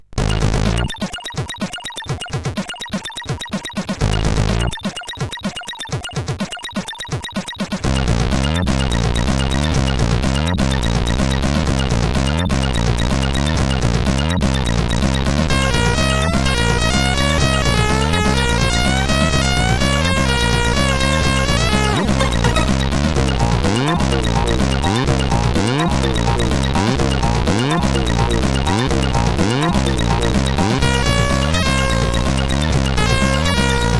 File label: Ferry Funny, sid CSG8580R5